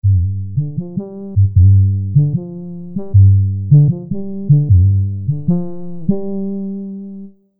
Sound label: Bassa Akoustika; UA Masterclass; Trip-Hop